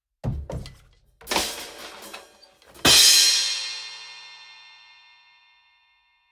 crash layer drumset

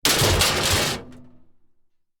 metal crash

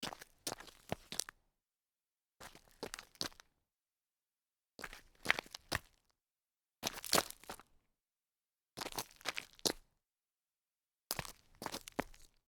turnaround on gravel, foley

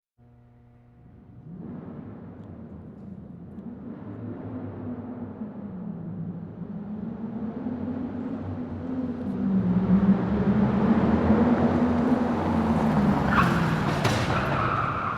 tires squeal continuous

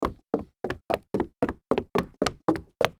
jog on solid wood

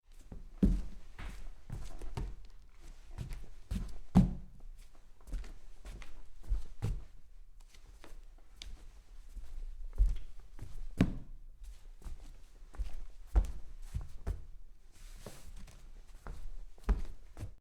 socks on wood start and stop

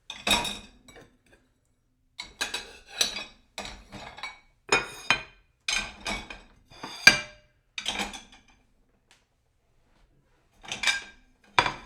load dishwasher

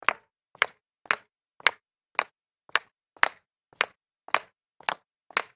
walk down concrete stairs, foley

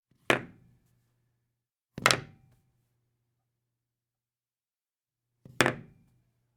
ping pong paddle on table

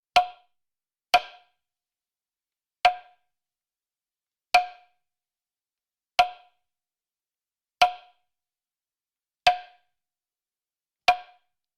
hitting a woodblock

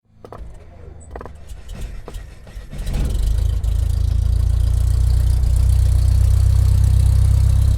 prop plane start

